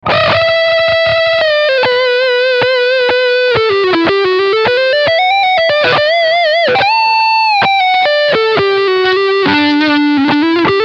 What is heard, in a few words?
Leadline Front PU